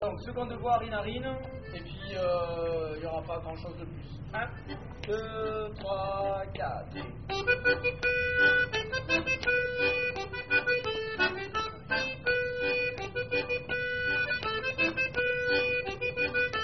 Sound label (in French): 2ème voix; Arin Arin